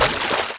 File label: splash